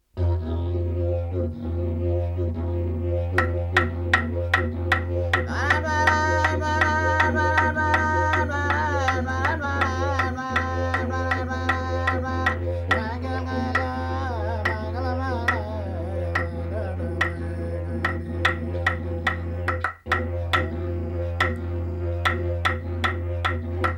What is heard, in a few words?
voice clap sticks and didgeridoo